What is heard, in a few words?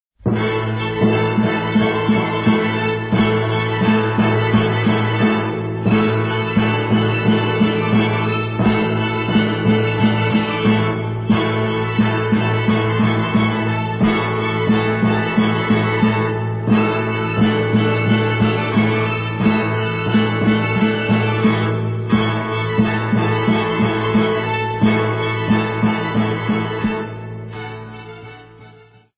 Square Dance; A Fistful Of Dollars